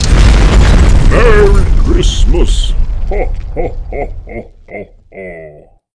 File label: c4 explode